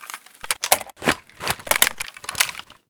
vihr reload, weapons

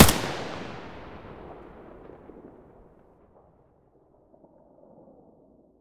ak74u muzzle mono; STALKER; IWP

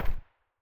sa58 silencer mono far

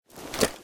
pistol holster; STALKER; IWP

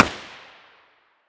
muzzle g36 mono far, weapons

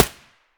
aps silencer mono distant; STALKER; IWP

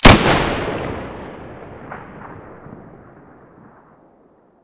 muzzle sv98 stereo

